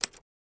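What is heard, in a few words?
Typewriter Single Key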